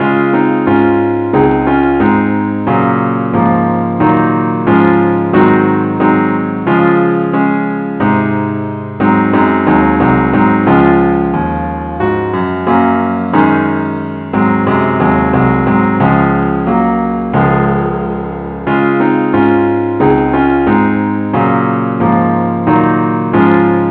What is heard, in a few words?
gwalchmai keyboard Only key des